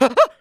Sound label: Monkey Near Falling; angry birds rio